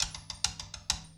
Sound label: rimmer, Drum loops